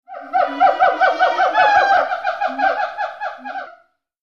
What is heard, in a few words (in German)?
tierstimmen sms toene siamang affe